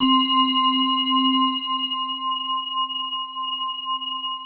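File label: PAD, atmospheric pads; Best Service; XX Large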